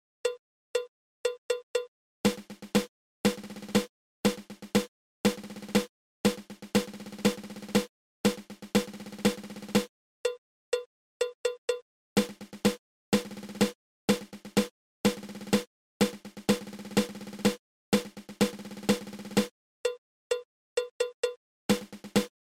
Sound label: Seven Stroke Roll